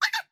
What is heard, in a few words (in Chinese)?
狐狸待机声